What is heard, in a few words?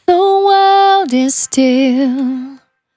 Finally 70bpm C, Velvet Vocal; Zero-G